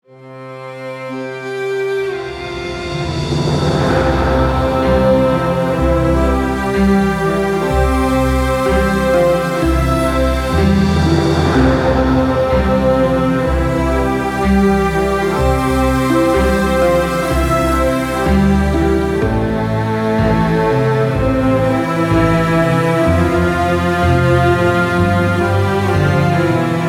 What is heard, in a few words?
Ocean Flight Journeys